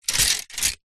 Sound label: household clothes hangers plastic bunch several set down; zapsplat